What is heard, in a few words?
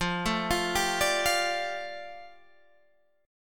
F 6th Add 9th F down Guitar Standard, chord; F6add9 arpeggiated